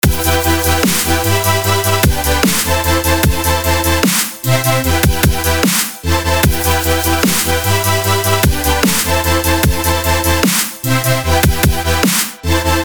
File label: BASS